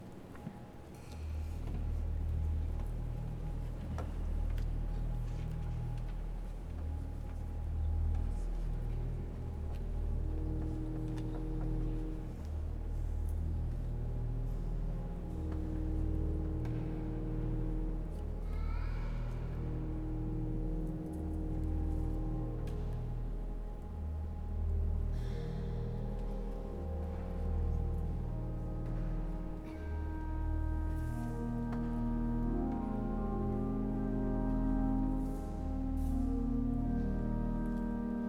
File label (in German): Orgelvorspiel; Straßburg